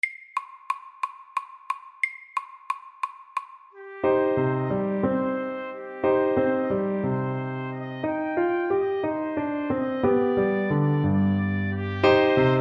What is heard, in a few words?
Bugle horn FORT